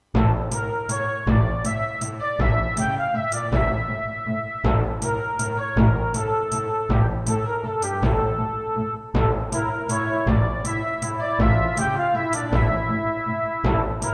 Roland SCB-7

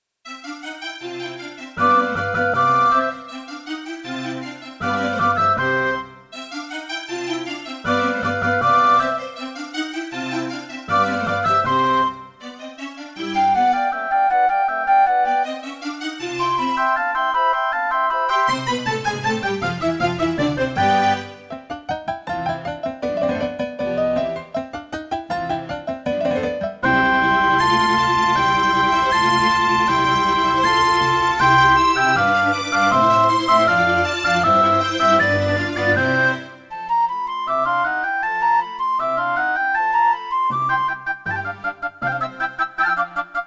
Mozart - Concerto K467 partial, Yamaha QY70

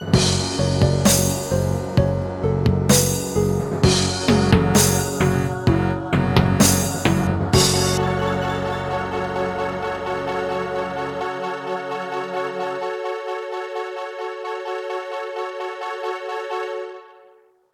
Alesis Harmony 32; sigil doom episode 5

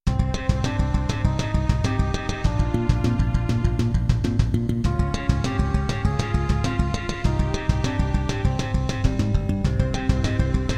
Kawai GMega; Hocus Pocus

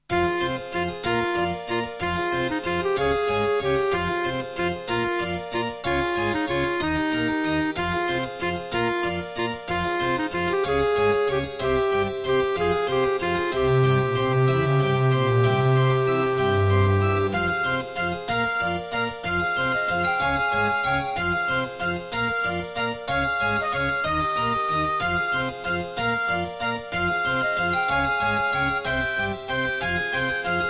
Track 6, Midiplus MiniEngine USB; Death Gate

